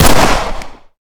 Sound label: fire, glock; Urban-Coalition; Decay; arccw ud